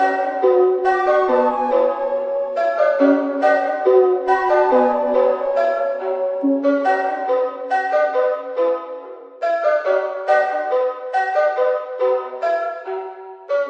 quirky minor melody funny instruments, synth and electronic loops